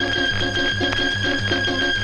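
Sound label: strings crackly, Loops; Phonky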